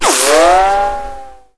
near miss, weapons

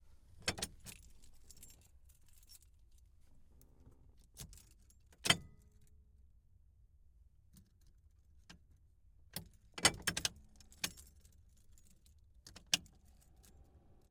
dodge challenger 1971 ignition and key; Dodge Challenger R-T 1971

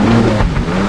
jetski bounce; hl2

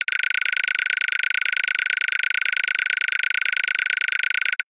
Counter Medium; UI & HUD

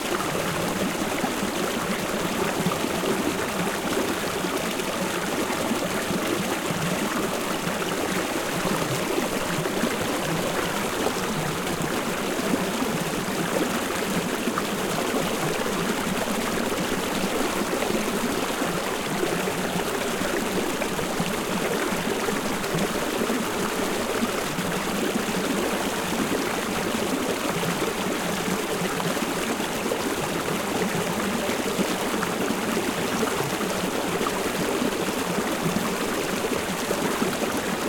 Stream Small Alpine Loop, Cascadia Flowing Water; Nick McMahan

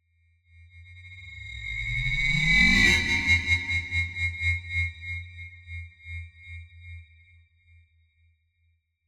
Cymbal Stinger Designed 5.1, Bowed Cymbal; Soundopolis

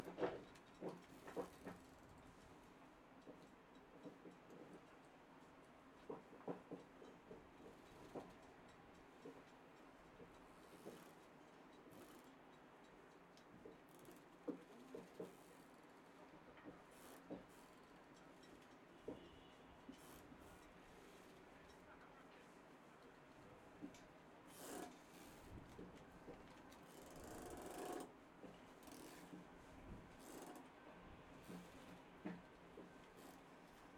Atmos, EXT Marina, boats, rope creaking; Rogue Waves; Yacht & Marina

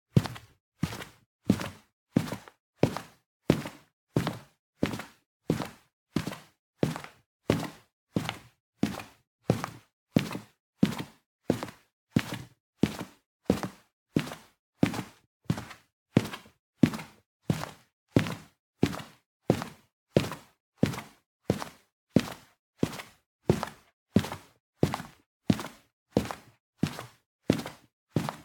Footsteps - Sneakers on Carpet - 90 fpm - Loop; The Sound Pack Tree; Footstep Loops

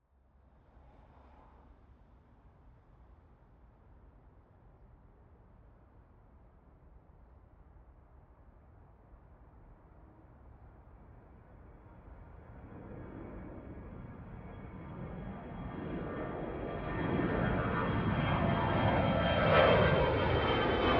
Planes, Takeoff; aXLsound; Planes - Takeoffs and Landings

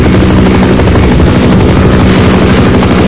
chopper rotor, vehicles; hl2

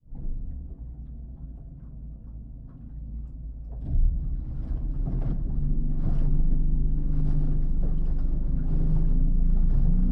Aluminium Boat Hull - ACCELERATION - Engine On; Pole Position; The Stormbat Hull Interaction Library

